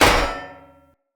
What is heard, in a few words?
Sphere Board Impact Default, Shields; Weapon Impacts; Sphere Sword And Board; Environment